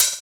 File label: Open Hhat, Hihat; Equipped Music; Loungin House